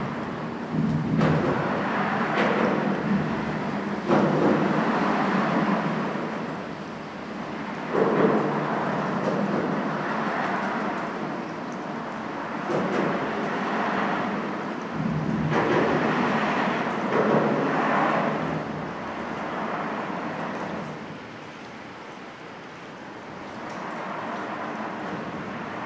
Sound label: under road bridge